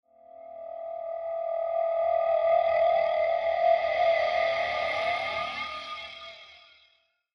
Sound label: Horror Swell Feedback Flange Eerie; Scary Tones; Blastwave